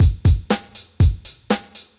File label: beat